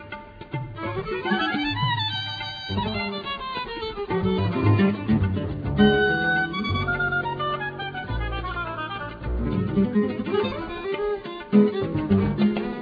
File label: violin; Oregon; Violin (Group improvisations)